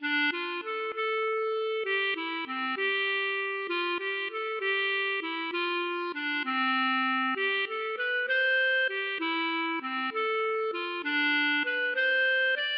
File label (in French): valse